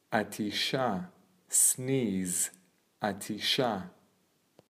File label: Sneeze